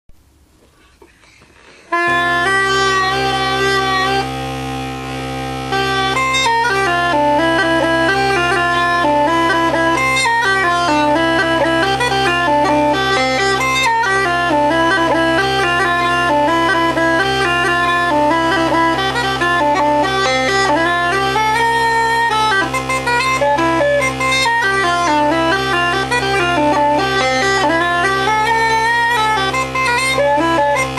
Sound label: childress c set, uilleann